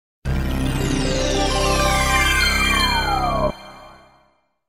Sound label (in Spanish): loop musica tono movil; SONIDOS WHATSAPP